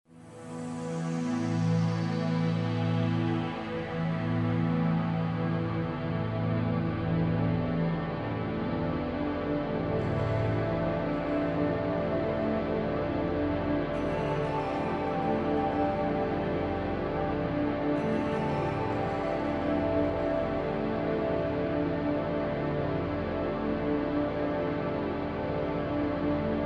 Amb Sound Scape Ethnic Zither (like a sitar), ambient chords, synth strings; Ambient SoundScapes